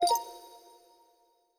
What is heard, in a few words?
Speech On; Windows Vista Beta 2, RC